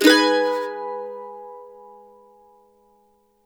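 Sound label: CEE CHORDS G# minor, Blues Guitar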